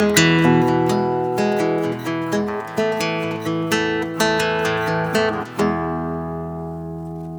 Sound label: Guitar - 130 BPM (G Major), Acoustic Guitar; Coyote